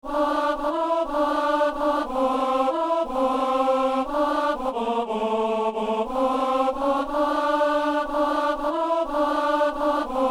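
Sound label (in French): ténor; messe simple; alleluia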